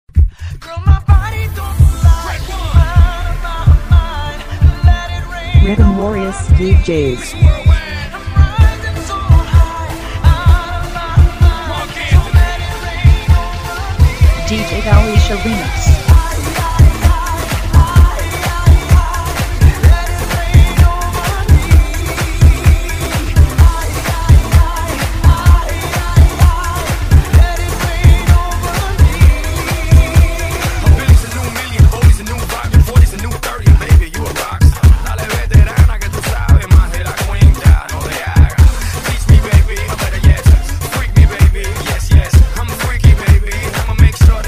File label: Thabla Mix